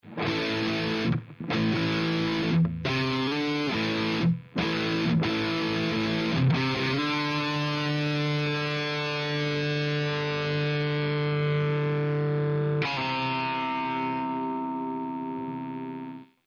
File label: jcm800